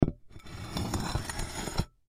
Plate Set Down, Plate Set Down Slide Wood Table Dish; Dishware; Blastwave; Household